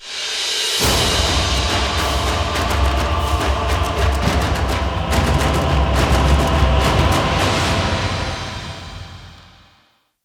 Mission Success Cue; Warframe; Cues

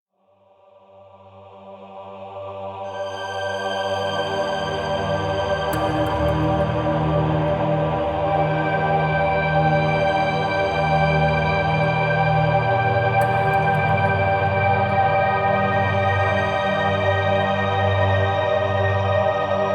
Ambient Random; Railjack; Ambient One Offs

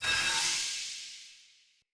Dagger Recall Button Press; Weapons; Player; Swords; Fey

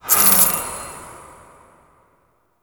Boon Reroll, UI; Duviri

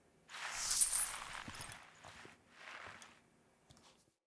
Foley Idle Variant; Friendly; Verminia

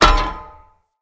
Foley Spear Drop; Characters; Enemy; Nimrod

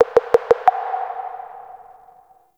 Interior Orbital Station Telemetry Mid

